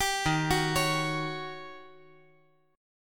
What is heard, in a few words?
D sharp 7th Sharp 9th D sharp; arpeggiated; D#7#9; 12,15,14,16; JGuitar